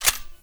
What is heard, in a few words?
Bow Latch; Grineer; Weapons